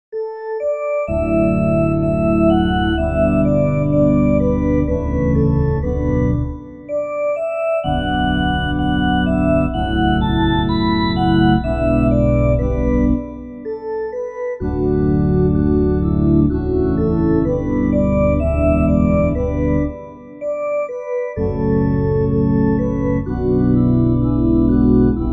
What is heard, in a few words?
keyboard